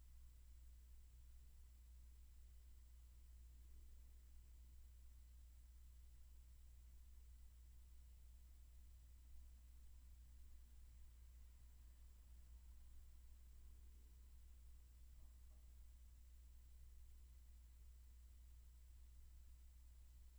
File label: room tone